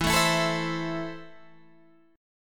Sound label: A Minor E